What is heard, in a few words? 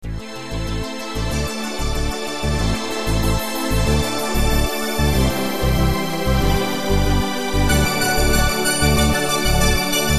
ringtone